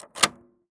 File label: EJECT; AKAI Anime Sound FX